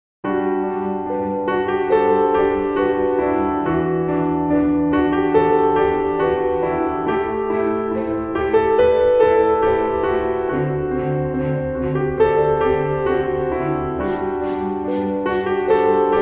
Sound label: Piano G